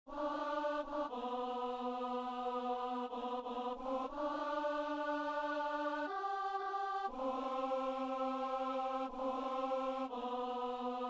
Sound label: alto; Saint-Denis